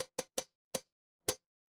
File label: impact anvil